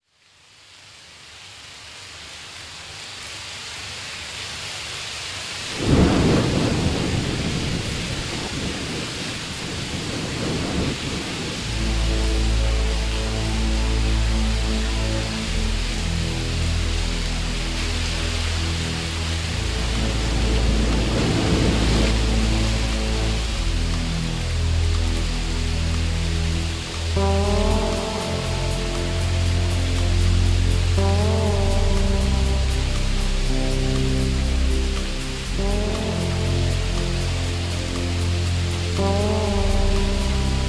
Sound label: Rain Cloud